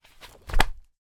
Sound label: foley notepad several pages flip over together